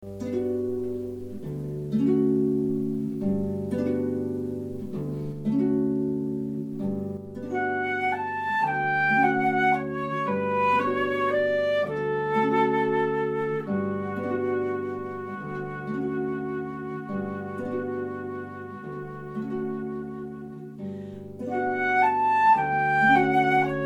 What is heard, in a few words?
gymnopedie no1, arpa flauto; Scambio della pace